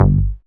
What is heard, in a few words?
BASS, Synth Bass; BA1 Synth-Basses; Sound & Vision - Gigapack I CD 2